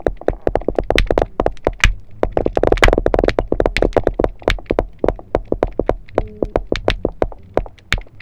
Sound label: unknown knock, Unknown Underwater Sounds; Unknow Soundes Recorded Underwater; knocking sounds; HELP!! I've looked and listened on the internet and have yet to identify these knocking sounds.